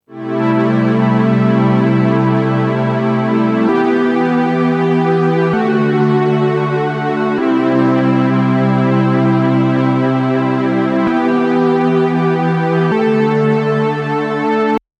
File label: Delayed Brassy Strings